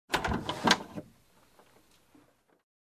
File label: Car Door open close